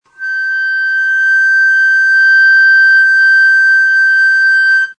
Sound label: F sharp 6 alt, flute; classical